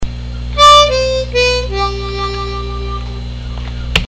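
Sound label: down riff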